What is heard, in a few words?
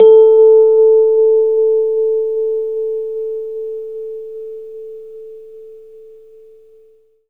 Rhodes A; 3D Sound; 3D Keys VOL-1